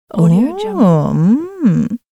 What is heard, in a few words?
female astonished voice